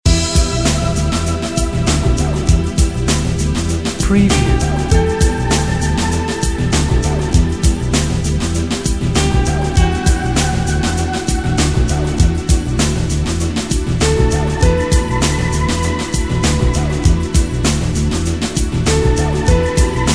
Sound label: Chill Out